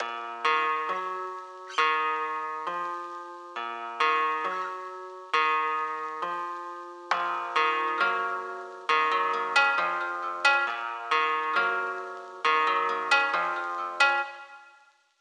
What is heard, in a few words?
Guitar